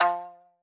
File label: marimba shamisen; ゆめ2っき